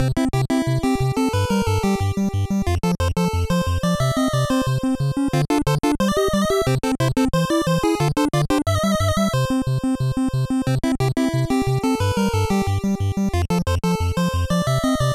bonus game, Bonus Round - 8bit, Bonus round/Minigame theme made with Famitracker